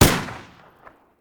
Rifle; Sound Ideas; Guns

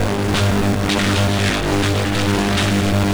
Sci-fi Electricity Hum Loop

